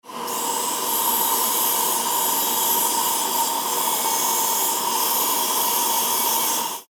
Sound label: aspirator and drill